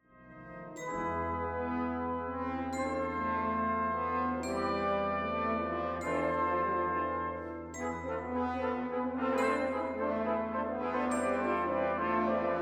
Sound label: Cuban Ouverture excerpt, brass